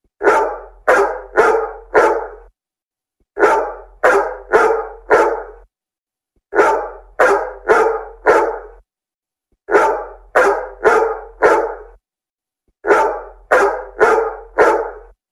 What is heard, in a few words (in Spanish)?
perro ladrando fuerte